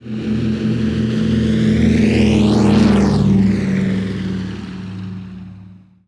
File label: DC 3; ylearkisto; simutrans; Pak128.Britain-Ex